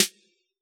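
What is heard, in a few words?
snare drum T8 M, Yamaha DJX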